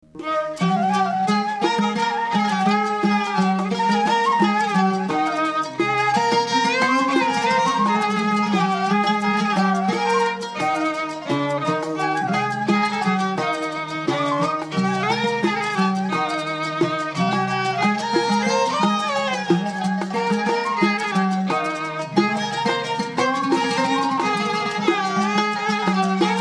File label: Riad Takht, group; Delta